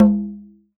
TUBANO; E-MU Producer Series Vol. 7 – Old World Instruments

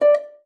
STOP C#5, harp; Xsample VOL-8; HARP+FX

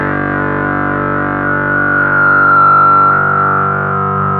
ARP 2600 G2, synthesizer; Hollywood Edge - Giorgio Moroder Rare Synthesizer Collection

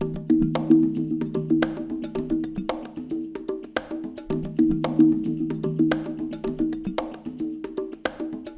AMB; Spectrasonics; Liquid Grooves 1 & 2

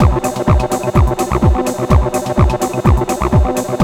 ACID JAM

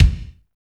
KIK, R&B Kits, Roland; Northstar - Drumscapes Roland; Wet Kit

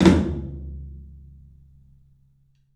PA TOM, 14 TOM; 3D Sound; 3D Drums; CLUB 14 TOM

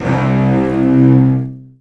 Virtuoso Strings, TRM FX; ILIO; Kirk Hunter Virtuoso Strings VOL-2; 10CL TRM FX

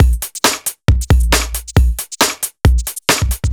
Beat, 136bpm, uk garage

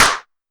Group Clap, Drumset; Roland SH-32

